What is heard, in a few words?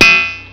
hit; Deliantra